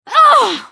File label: female pain hard; firefight